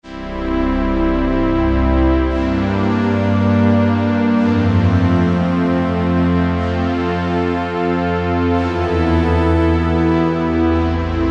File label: Steamsynth, Korg T3